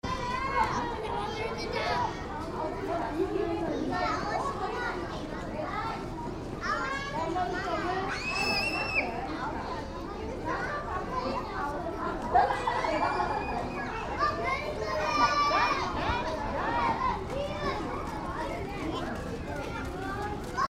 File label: nursery school